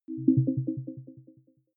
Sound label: button echo chord arpeggio